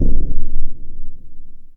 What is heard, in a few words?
Hip Kick; Quasimidi Rave-o-lution 309